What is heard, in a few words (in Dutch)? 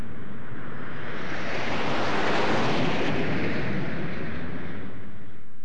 vliegtuig